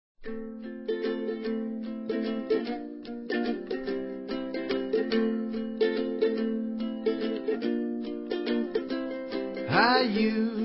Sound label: Acoustic